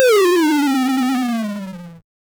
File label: a short 8 bit retro death; 80s